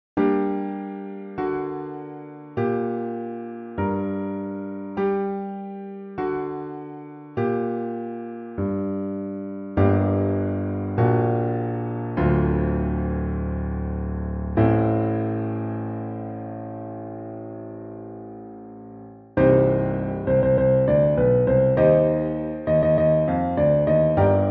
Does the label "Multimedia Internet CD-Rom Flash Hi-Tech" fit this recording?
No